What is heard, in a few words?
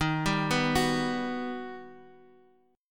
G Augmented Major 7th D sharp